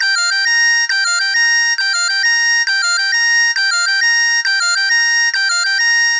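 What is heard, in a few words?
flyer alert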